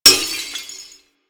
Glass Impact; Warframe

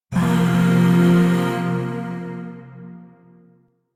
Shawzin Hirajoshi Chord A; Chords; Warframe; Zariman; Void

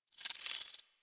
Collar Jingle, Pets; Warframe; Zanuka; Zanuka Companion A

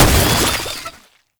Infested Spore Pistol Shot; Weapons

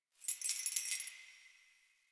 Keys Jingle

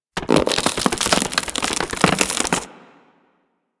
Ruptured Inflate; Enemies; Abilities; Warframe; Corpus; Arachnoid Hunger

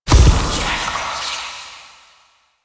Shadow Smoke Teleport; Warframe; Duviri

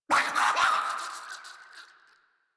Death; Grineer Jester

